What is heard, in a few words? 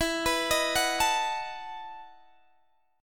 E Major 7th Suspended 2nd Suspended 4th, Guitar, DADGAD